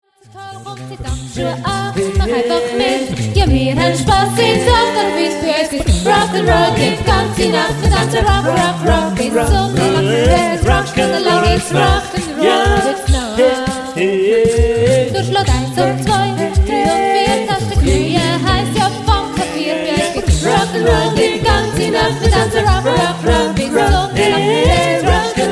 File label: Jive